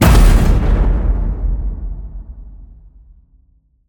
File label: HIT Metal Crash, Cinematic Hits Designed